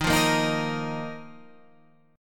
D sharp Suspended 2nd D sharp, Guitar Half Step Down; chord